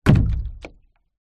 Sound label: industrial cannister small plastic liquid down concrete